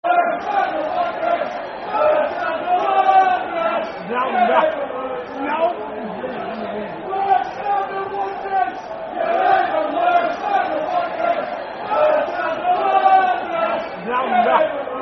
Worse Than The Wanderers, Football Chant (Soccer Song); Walsall Wfc; fanchants